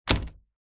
household door office close small room; zapsplat

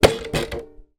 musical ukulele drop

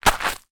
foley footstep single on gravel dirt; zapsplat

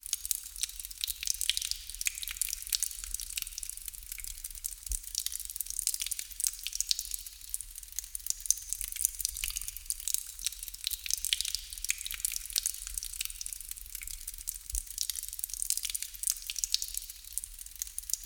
water drips fast trickle cavernous reverb

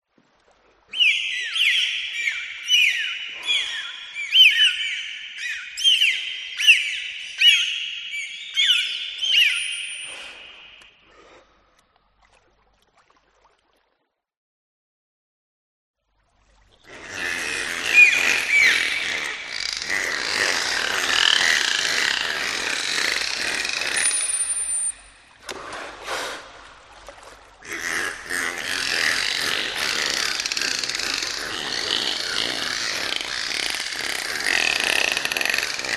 Dolphin; audio hero

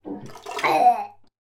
human 5 year old boy vomit in toilet

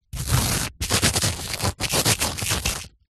foley dirt thin layer scrapes

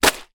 wet towel drop on ground; foley; zapsplat

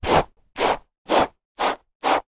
human brushing hair with hairbrush; sound effects one

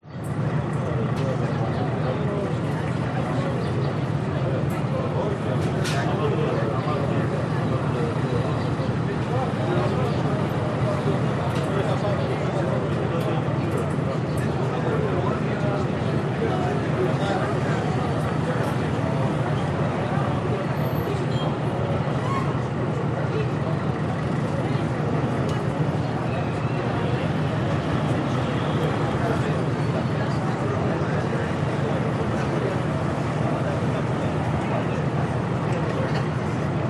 Greece Harbour; audio hero

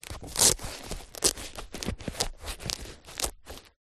foley sneaker childs handling velcro movements; zapsplat

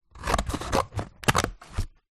office scissors cut greeting card; zapsplat

